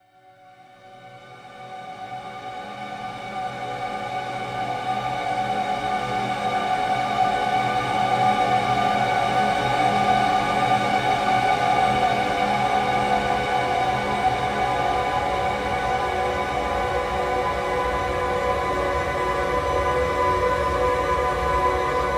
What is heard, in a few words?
Badinerie with Spectral Dronemaker; SOUNDMAGIC SPECTRAL; Michael Norris